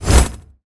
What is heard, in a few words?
Blazing Core Parry Activate